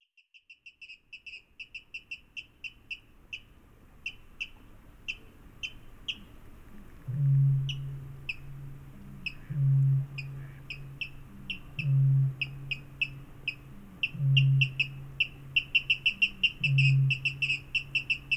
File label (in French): le marais la nuit